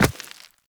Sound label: Walk Rock, Footsteps; Operator